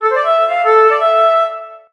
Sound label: romantic jazz